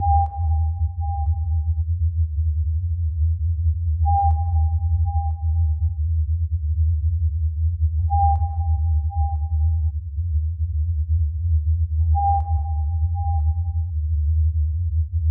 Submarine

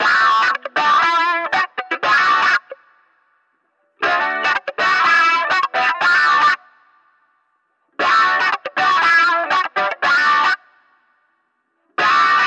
Funky Guitar

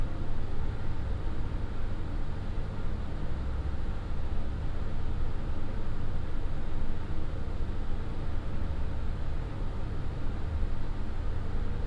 Ventilation

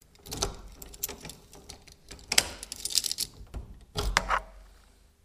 Door Closing With Sound Of Keys

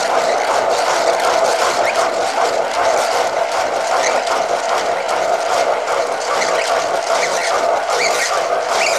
Noisy Wheel Machine

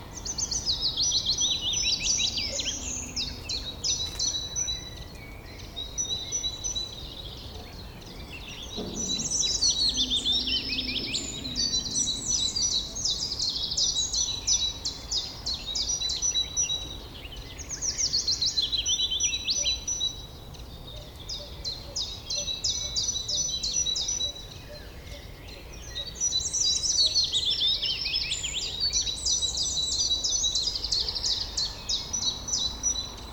Spring Forest Field am

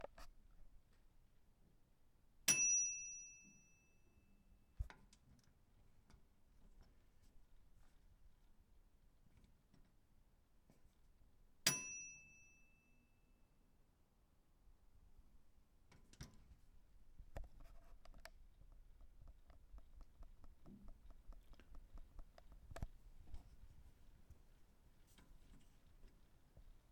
Microwave Ping

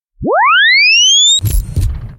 Defibrilator

